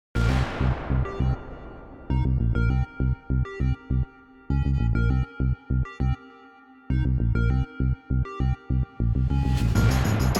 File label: Cinematic Rock; Soundraw Demo